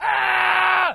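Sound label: Death Screams Male, battle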